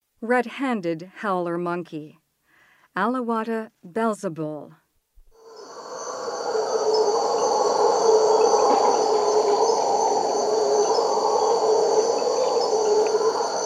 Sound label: Red-handed howler monkey; S. America; Rainforest